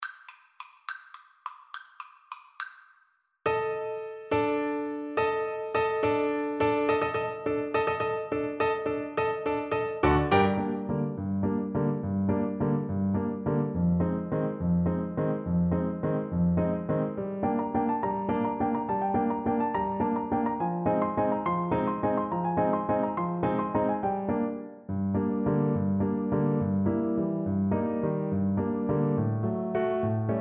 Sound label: danse, Tuba